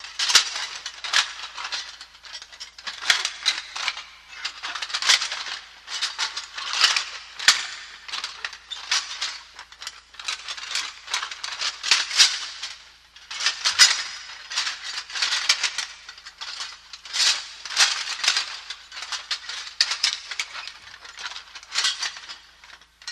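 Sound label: Plate Armor Clanking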